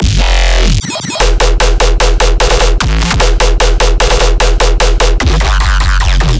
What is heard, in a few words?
bass loop chuggy 150 E; Virtual Riot